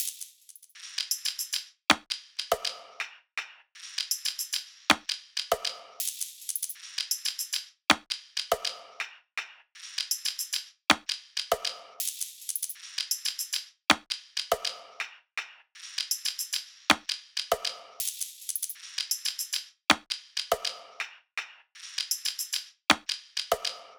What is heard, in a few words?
loop perc 80; Seismic Loops